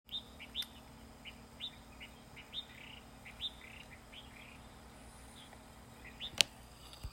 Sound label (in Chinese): 白環鸚嘴鵯